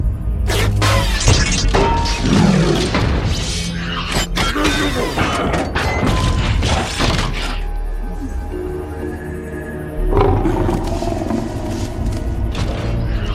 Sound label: transform; megatron